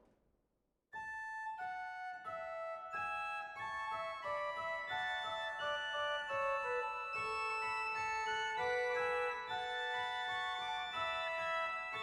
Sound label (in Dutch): Bovenpositief Superoctaav 2